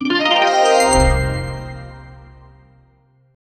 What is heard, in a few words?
elegant refined logo reve, classy